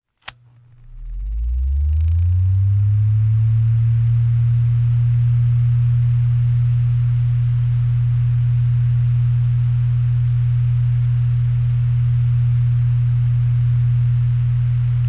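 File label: fan portable medium; fans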